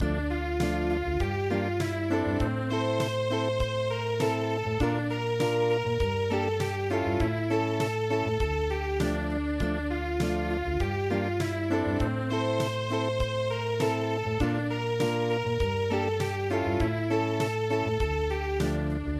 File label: Unplugged; Uraru Song